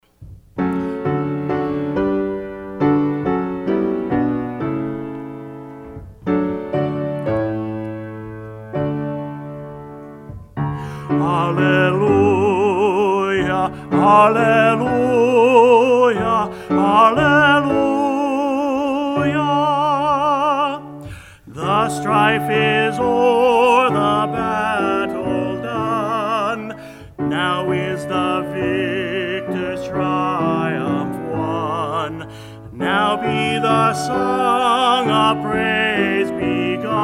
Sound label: Closing Hymn